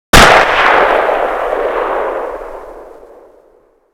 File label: Inf Weapons; Red Orchestra 41-45